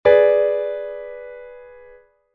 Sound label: G♯°, Chord Inversions